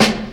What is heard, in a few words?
2000s short jazz funk snare g sharp key; snare drum samples